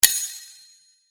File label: Sword Sound; FXHome; Fighting Sounds